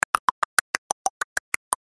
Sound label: clac clac